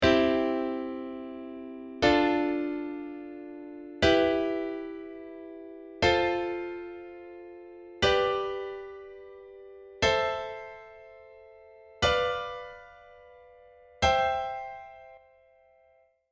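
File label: piano chords C to C, circle of fifths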